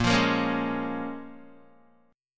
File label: Bb Minor 7th, Guitar, Bbm7 chord; Bbm7 strummed